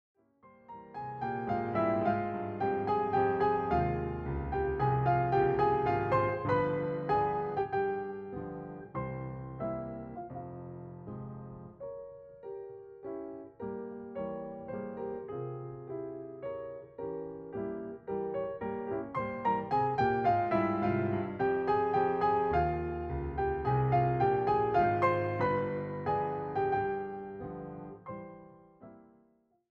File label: Hungarian Dance in F Minor- Con moto – Presto; brahms; 21 hungarian dances; WoO 1